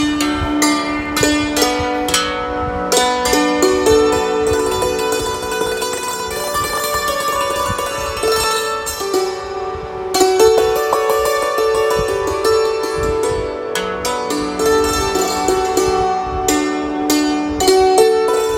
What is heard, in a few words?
Santoor Musical